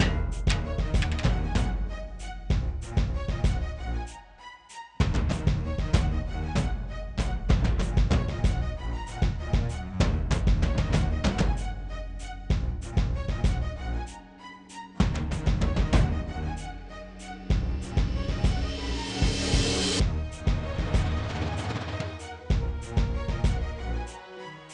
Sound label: Boss Music; crouching pony hidden dragon